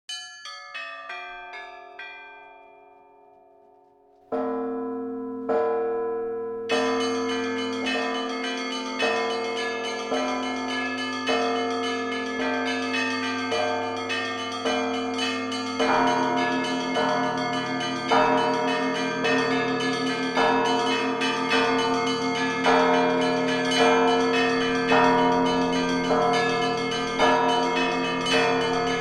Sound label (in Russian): Zvon na osnove tradicij, kolokola; rossia; Troice